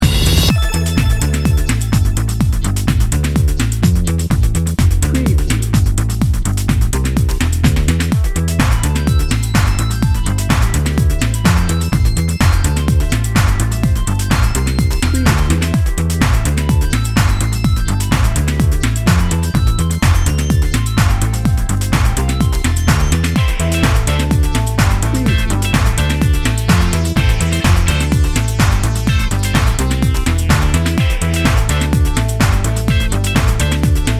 corporate technology edm

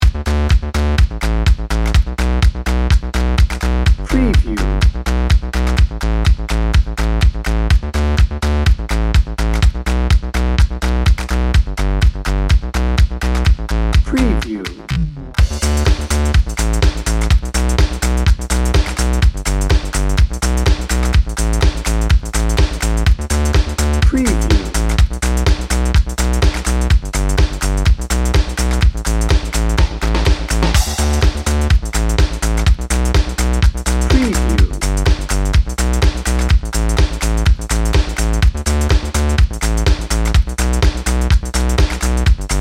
happy carefree indie pop

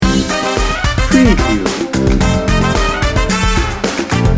funky bones loop

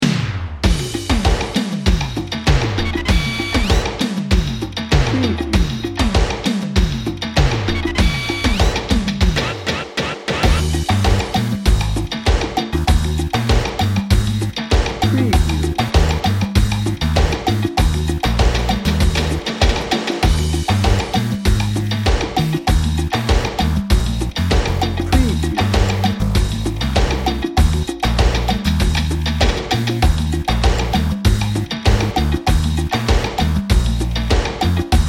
tropical party dnb

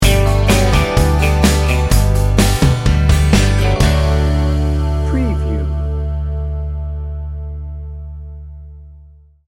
country friendly sting bumper

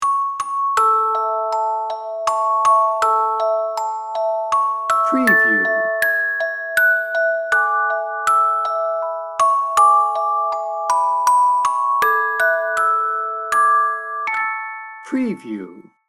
brahms lullaby

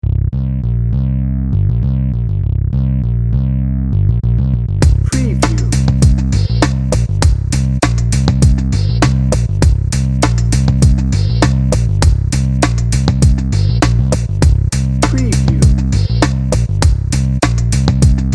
hypnotic chill out dnb

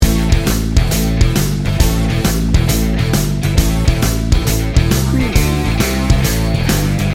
driven to win loop

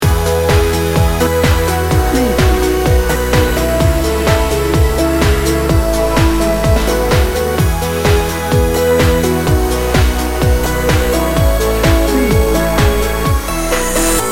joyful edm

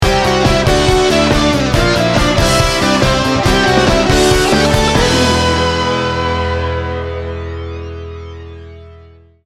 positive outlook sting bumper

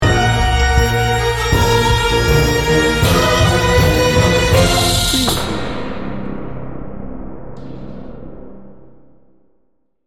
plucky monsters sting bumper

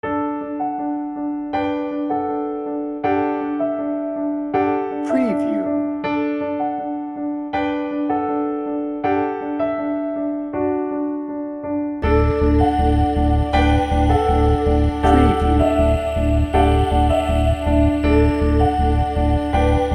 hope and inspiration

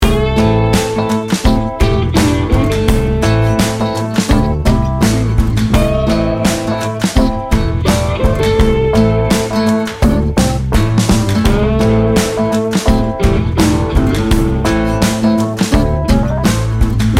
gator hunt

